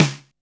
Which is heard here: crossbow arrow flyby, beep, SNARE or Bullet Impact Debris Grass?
SNARE